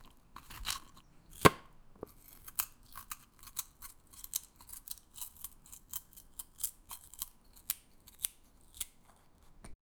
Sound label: chooping vegetables; preparing